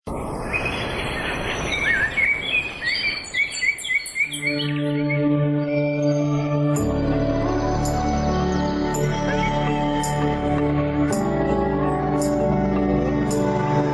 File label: Vocal Removed HD Karaoke Sample Track